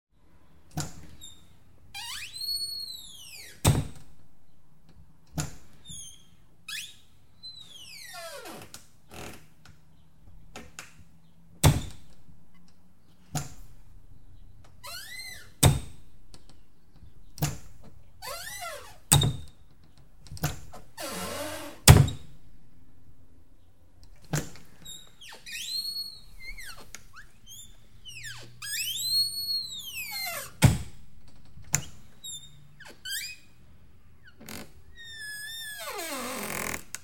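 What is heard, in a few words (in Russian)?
cabinet doors with a creak in a general shot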